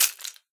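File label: rattlebone, percussion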